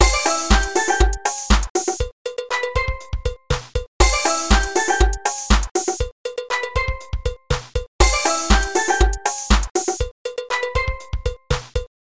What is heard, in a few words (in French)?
CARILLON